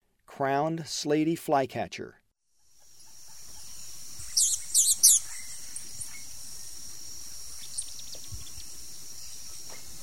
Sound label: Crowned Slaty-Flycatcher; S. America; Eastern Lowlands